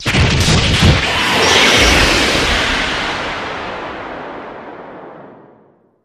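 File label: Gate Flash Discharge, spaceship fx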